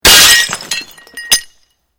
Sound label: Glass pane smash; Material components for constructing more complex sounds; Materials